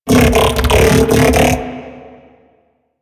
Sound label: Mech Ambient Vocal